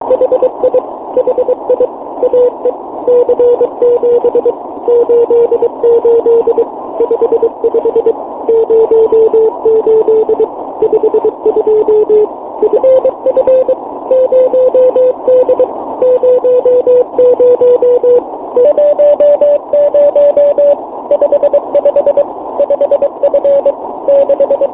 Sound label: cw; fo-29; bake